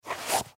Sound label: Shoe Take Off, Shoe Take Off Toddler; Shoes; Objects; Blastwave; Foley